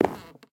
wood, dried ghast; step; minecraft snapshot; block

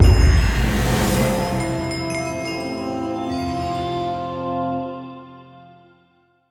challenge complete, toast; ui; minecraft

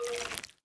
ambient, mob bogged; minecraft 25w18a

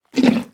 eat, horse; minecraft; mob

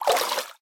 swim, liquid; minecraft

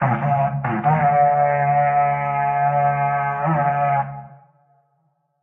call, goat horn; minecraft; item

